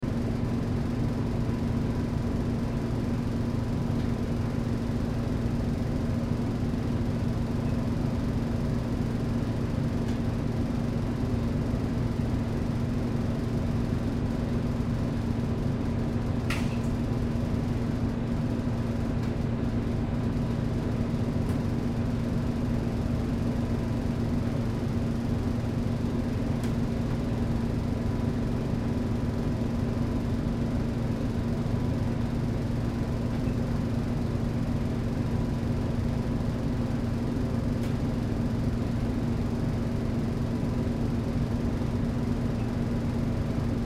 Sauna Room Tone Fan Water Drips Wood Creak Loop; Metal Rattle; Sports; Blastwave

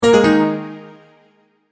multimedia tone end

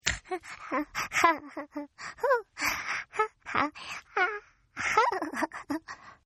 Baby Laugh; Laughter; Partners In Rhyme

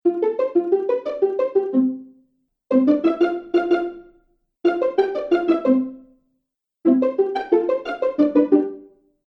Cartoon Strings Pizzicato; Cartoon Music

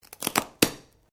Branches Dead Snap

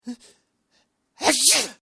Sneezing Male Sneeze; human sound effects

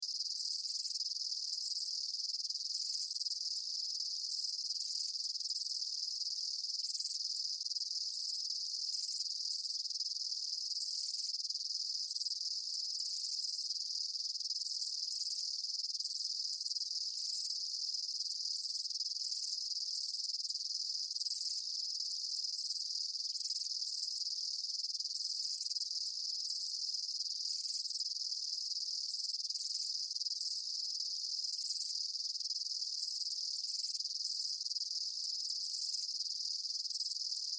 Ambience, Insects, Chirps, Day, Bugs; Outdoors; Backgrounds; Sound Effects:Backgrounds; Blastwave

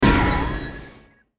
button burst; Multimedia; Buttons

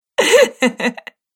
Woman Laughter

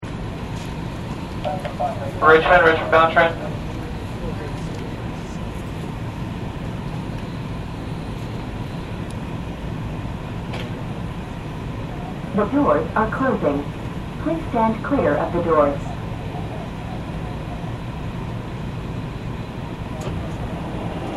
Subway train interior; Subways

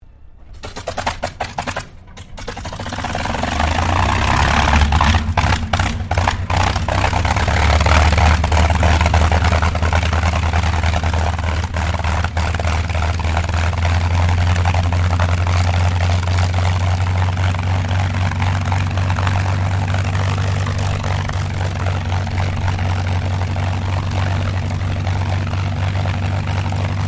AIRCRAFT CAC CA-18 MUSTANG MK23 STARTUP IDLE TAXI; CA-18 /P-51 Mustang; Airplanes; Vehicles; Aircraft Collection; Twistedtracks

